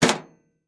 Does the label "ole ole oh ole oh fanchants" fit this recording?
No